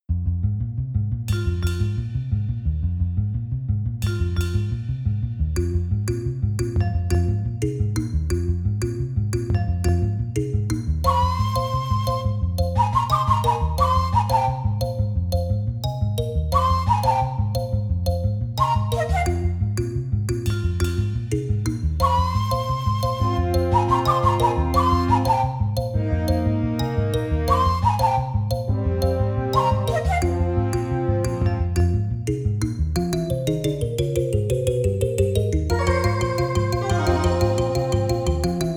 Treacherous Forest (IBM-PC GeneralMIDI); Hocus Pocus

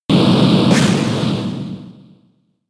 Whirlwind; Pokemon; gen 6; XY; ORAS

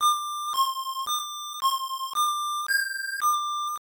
Ocarina Melody

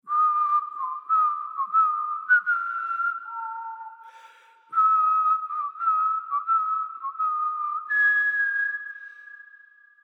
Whistle; Age of Conan Hyborian Adventures; Whistle for King Conan

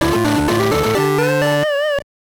Jingle; asterix obelix contre cesar 1999 gbc

